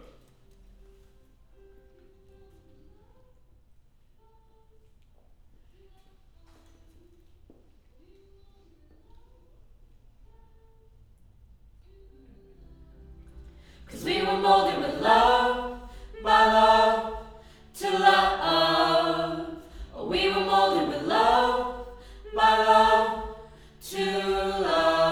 Choir Mid

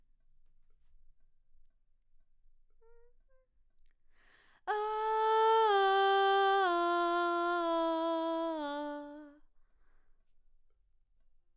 BG Vox

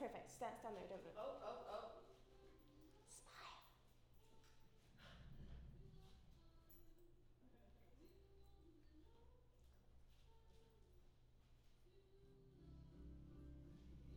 Claps L

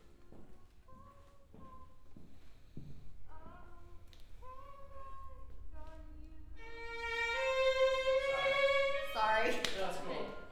Cello

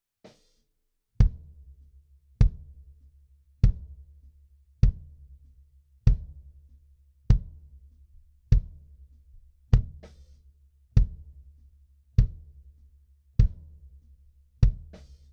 Kick

